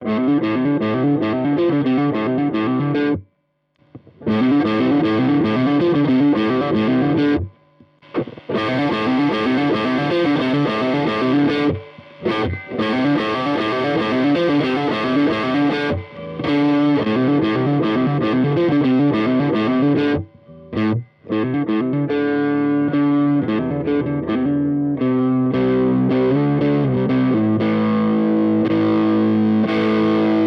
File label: Compadre Fender Gain Squeeze Kompressor Treble Boost